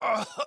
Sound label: AHurt